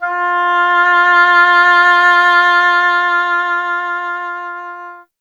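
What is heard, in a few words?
oboe, wind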